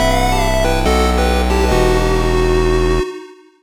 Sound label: fail; no straight roads